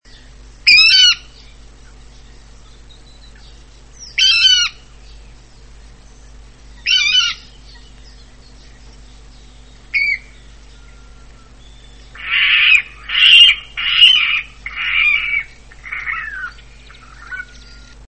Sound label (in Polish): wodnik, wodnik - głosy samca